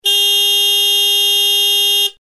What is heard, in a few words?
sharp tone horn set high; hella